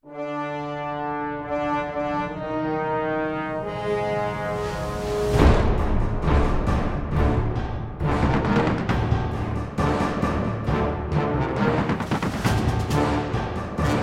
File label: Hospital Ambient; Gears of War 2